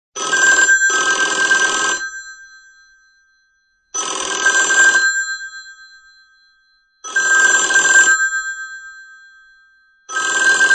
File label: old bell